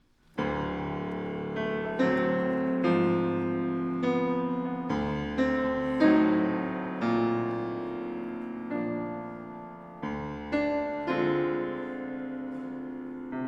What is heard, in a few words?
Violin Sonata In A Recitativo Fantasia Ben moderato molto Lento